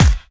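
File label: snare drum bass drum, Dance Mega Drums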